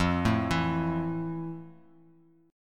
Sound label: F Major 7th; arpeggiated; JGuitar